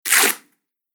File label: Tearing Fabric; Ripping Sound Effects; Foley